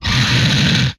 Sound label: Inhuman Growl, Vocal; retro arcade